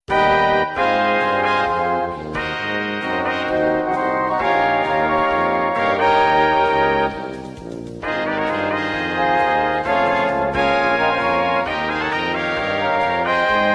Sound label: MEDIUM FOLK